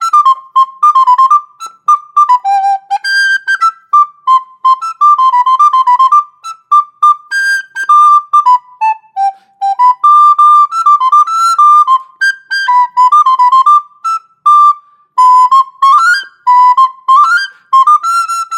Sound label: Aulos 205A classic